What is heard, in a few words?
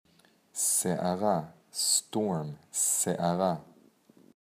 storm